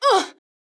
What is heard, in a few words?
woman injured grunt